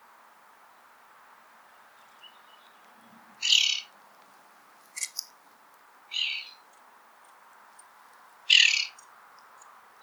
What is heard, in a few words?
Williamsons Sapsucker Sphyrapicus thyroideus; Montana